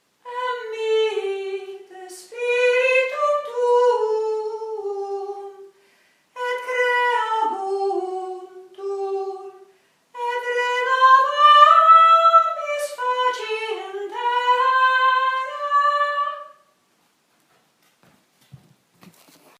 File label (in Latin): Emite Spiritum tenor